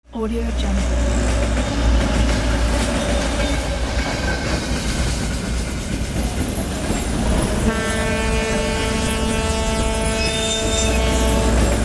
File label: freight train